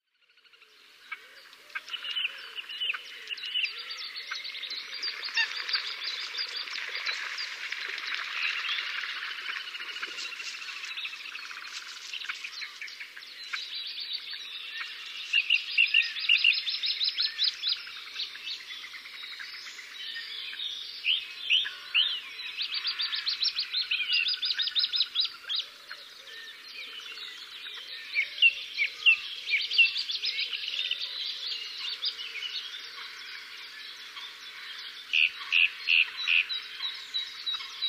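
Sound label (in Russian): swamp in the daytime sounds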